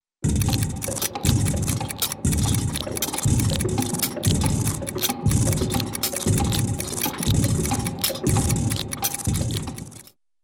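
Huge Clock Interior Ambience with heavy Mechanisms, cartoon; Hollywood Edge; Cartoon Trax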